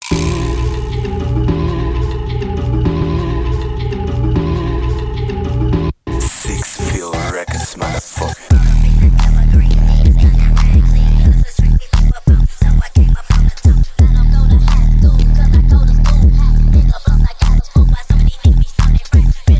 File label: japanese stutter phonk